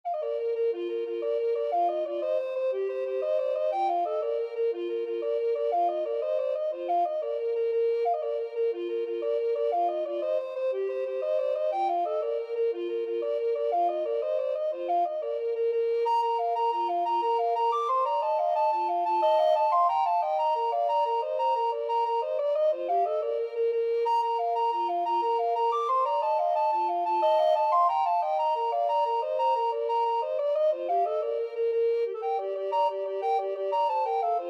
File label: 2 alto recorder, alto recorder duet